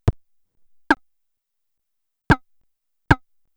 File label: midi notes latency